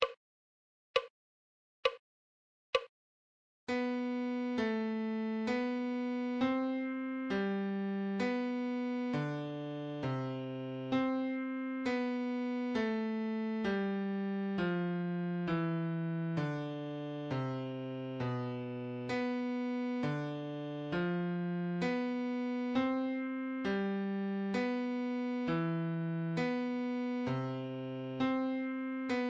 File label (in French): Clé de Fa Adagio